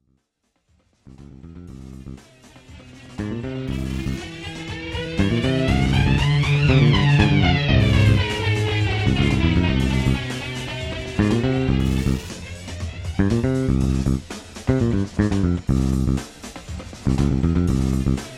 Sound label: Flangy Wangy, hand-crafted ringtones